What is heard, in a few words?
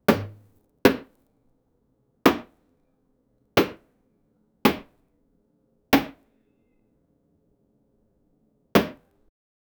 thousands of bananas hitt, fruit